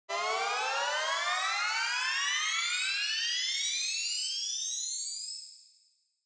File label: rise; massive